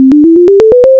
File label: scale